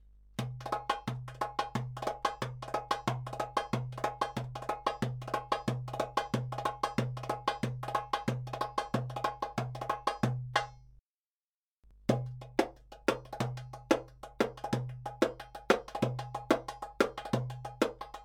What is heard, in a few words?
Darbuka